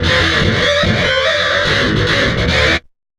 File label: GTR LOOPS